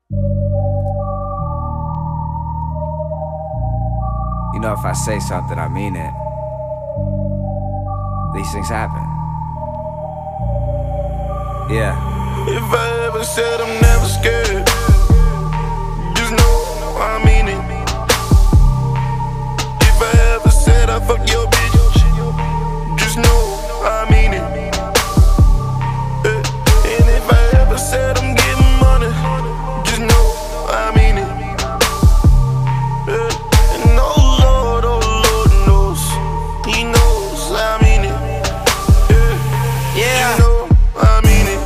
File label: amb afcaves int, ambient; modern warfare 2